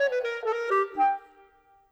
Clarinet; Sonic Foundry (Sony Creative Software); Orchestral VOL-2; Modern 27

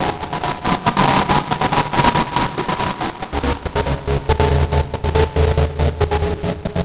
Envelope Beat; Beats; Drums & Drones

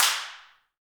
handclaps, Heart of Africa

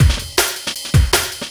Funky Beat, Drum N Bass

